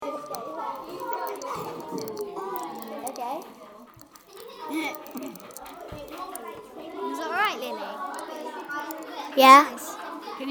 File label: fizz wizz popping